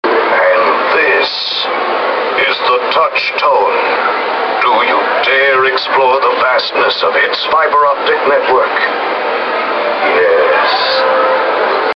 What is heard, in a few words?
phonebooth